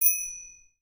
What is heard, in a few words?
Bicycle Bell, notifications; Samsung; Galaxy A56; Retro